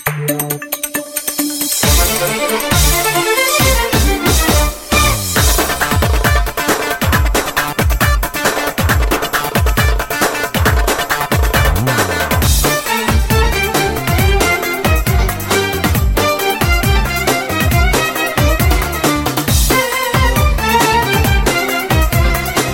arabic dance

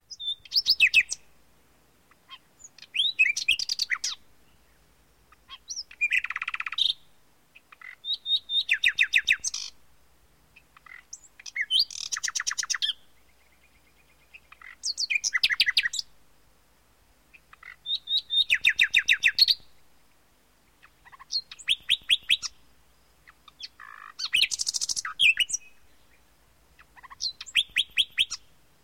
nightingale

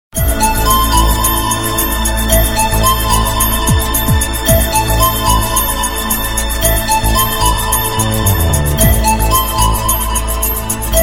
short tone

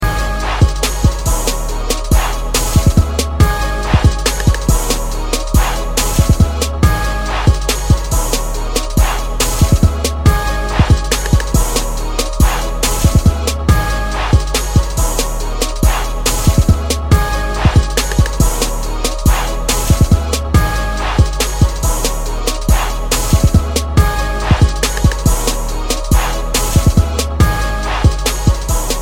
hip hop ringtone

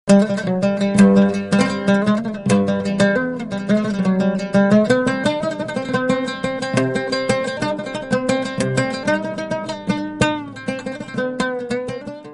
sitar music

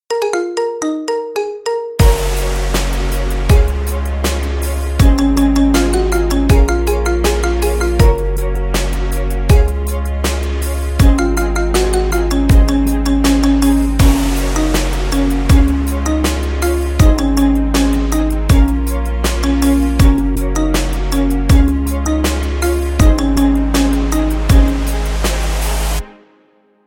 marimba remix

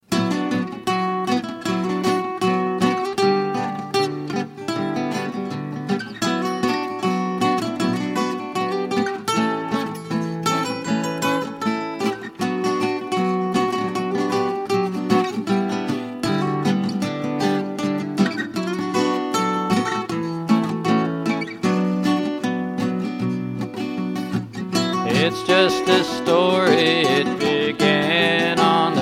country song

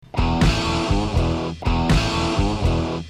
sms rock

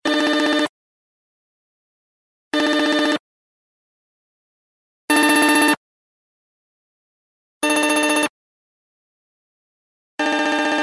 htc one ringtone

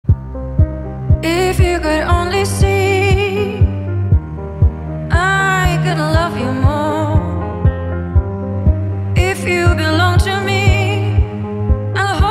trap remix

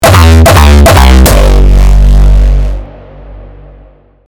hardstyle kick sms

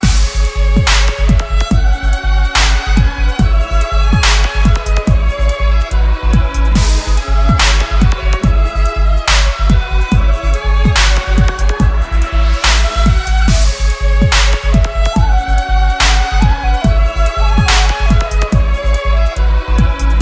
violin

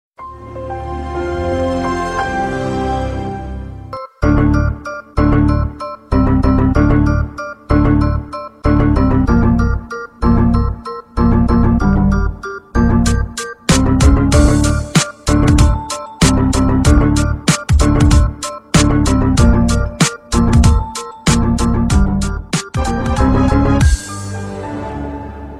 windows 7 remix

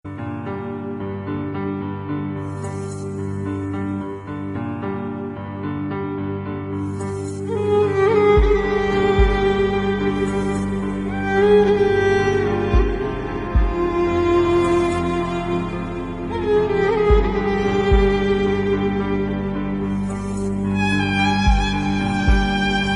calm sad tone

